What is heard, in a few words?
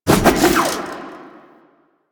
Staff Spin Swing; Warframe; Duviri; Dax; weapons